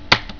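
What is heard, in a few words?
Key Click; KeyClik; Time Instructor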